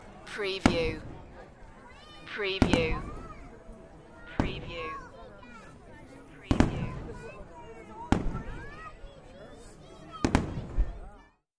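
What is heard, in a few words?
Fireworks Crowd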